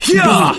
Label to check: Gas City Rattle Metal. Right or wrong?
Wrong